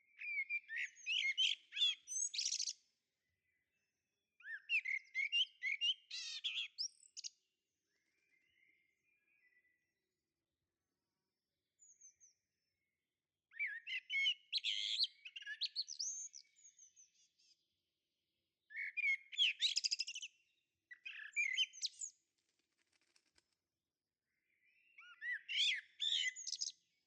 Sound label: bird